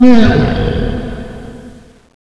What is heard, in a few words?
zombie death; passzombie